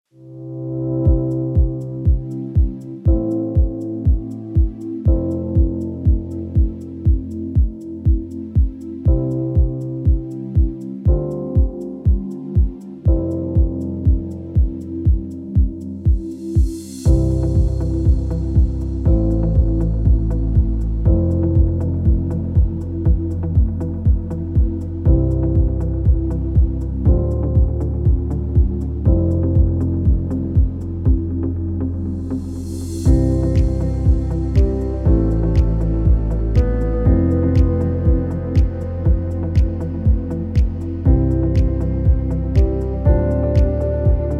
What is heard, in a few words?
Chilled Ambient